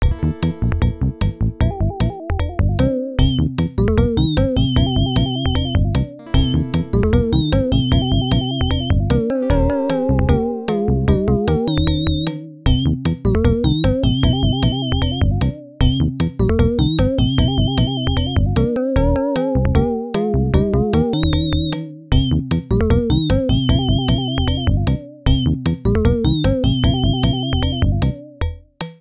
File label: Goal; super sprint; atari system 2; arcade